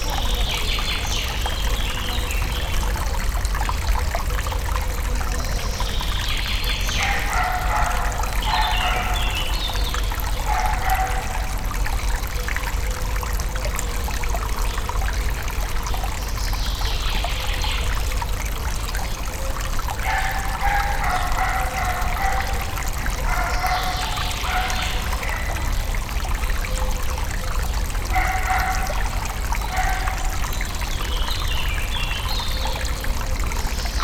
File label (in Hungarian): környezet, japánmakákó; szegedi zoo 2011